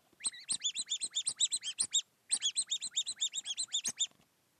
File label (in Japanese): piyo piyo hiyoko